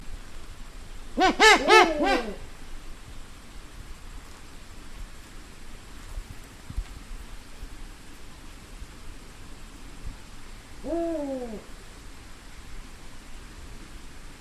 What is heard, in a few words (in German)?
3 uhus